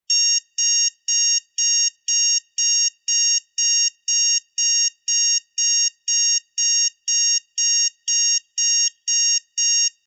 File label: Alarm Clock